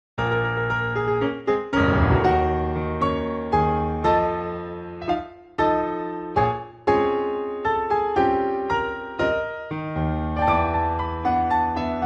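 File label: piano version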